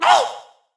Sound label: gobbo pain; Fight Knight